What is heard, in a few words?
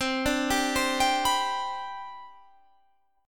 C Major 7th Suspended 2nd C down Guitar Standard, chord; CM7sus2 arpeggiated; JGuitar